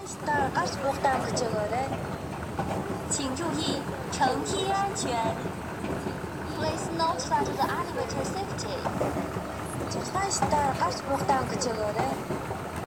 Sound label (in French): Gare hohhot escalier roulant